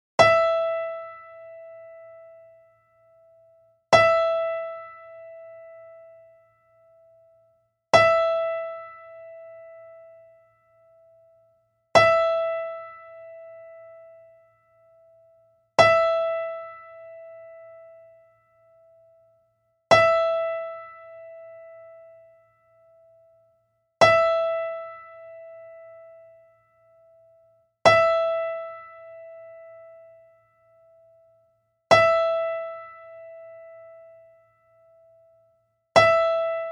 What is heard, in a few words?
Mando E